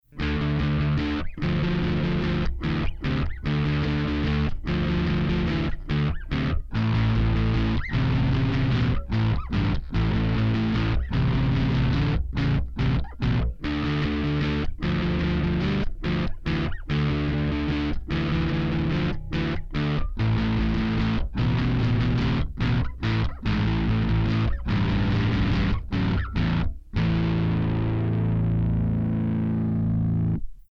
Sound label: VT500 Tool Style; Bogart